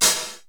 909 PHH, 480L; Wizoo; Platinum 24 Electronic; 480L GA 909

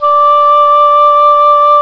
D5 OBOE; ORCH.COMP

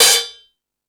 Fusion Stix, Drum Machines

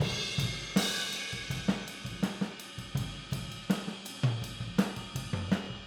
Boogaloo Fill Overhead ST, 82 BPM, Drums; Sonic Foundry (Sony Creative Software) - Drums from the Big Room The Mixes

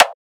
drum rim brittle; Virtual Riot; Drum Hits

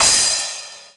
percussion one shot crash high tail; red; Sample Magic; Classic Chicago House; Drum Hits

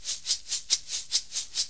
6-8 Shaker, Perc Loops

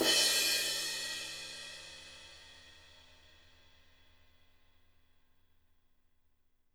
CRSH, CRASHES

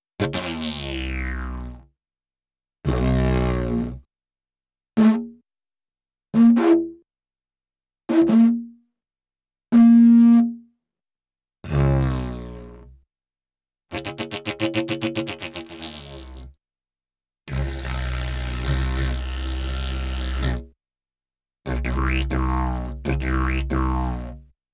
Didgeridoo And Overtone; Good Stuff Audio

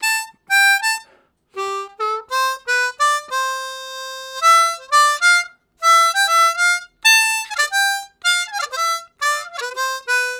Sliding, Harmonica; Zero G

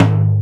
TAIKO, Ensoniq ZR-76

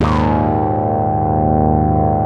QUACK CHORD; InVision Interactive; Keith Emerson; The Most Dangerous Synth and Organ

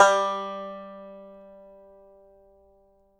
banjo G3, banjo notes, country; Sampleheads; Whole Lotta Country 1 & 2

